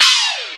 STAB

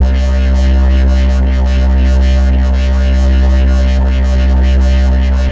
Resonance Ring Bass; AMG - Prototype Drum & Bass

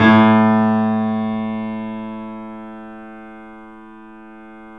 A -L, The Piano; InVision Interactive - The Piano

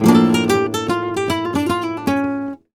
Flamenco Guitar Remates De Pulgar, a

